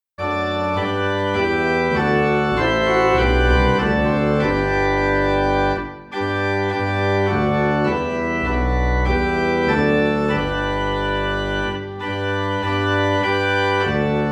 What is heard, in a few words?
Organ in G; Praise God From Whom All Blessings Flow (Doxology)